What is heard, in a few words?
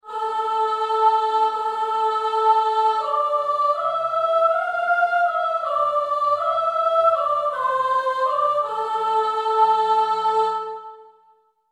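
Soprano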